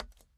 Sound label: step Bare, footstep